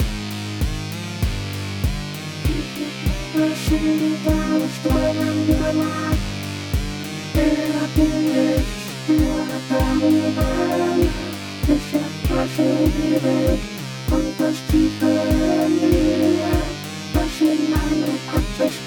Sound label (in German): Suesse Vocoder Traeume; KV331